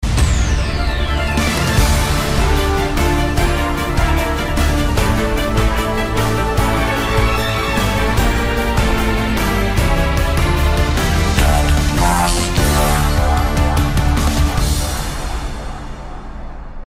Radio Pop, Radio style ads intros sweeps & jingles